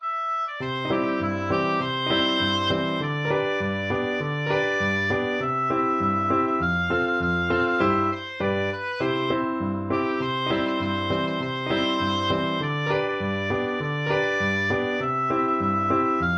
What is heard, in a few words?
grand, oboe